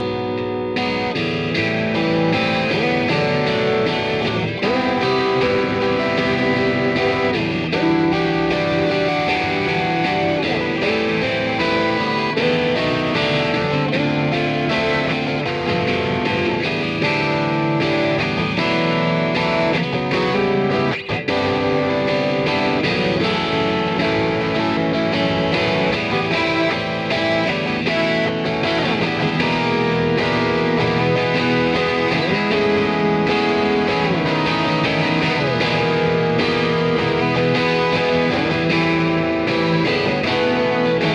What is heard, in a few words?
guitars after